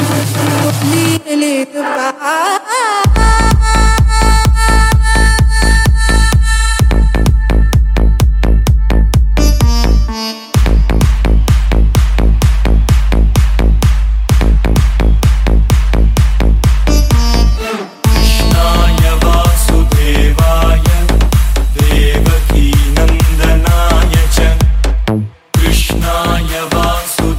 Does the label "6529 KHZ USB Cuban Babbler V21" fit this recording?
No